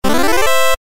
勝利 ファンファーレ レトロ

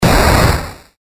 機械の動く音 短め; モノラル

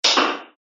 スイッチ; ＲＰＧゲームのダンジョンやフィールドなどに使える

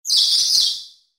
モンスターの鳴き声

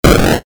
ダメージを与える・受ける レトロ モノラル

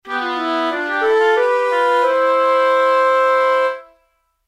休む 休憩 寝る 笛

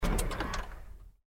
車のエンジンを切る音; 環境音

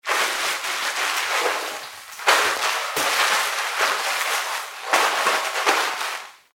体をあらう

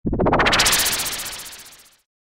場面切り替え 風

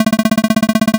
会話; nes retro talk sound effects

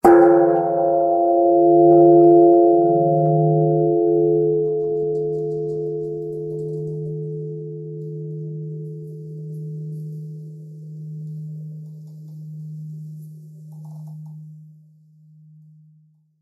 鐘の音

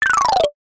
場面切り替え 木琴 下り 遅め; 場面切り替えや心理描写; 演出効果音

